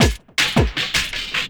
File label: Loop, Drum & Bass, 160 BPM